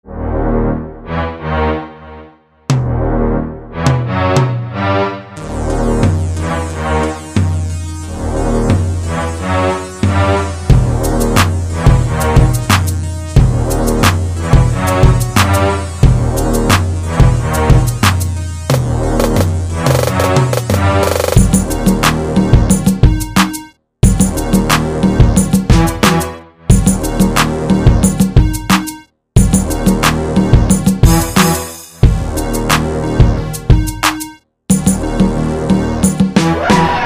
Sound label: a string thing beat instrumental